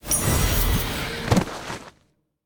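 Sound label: Wind Wolf death, monster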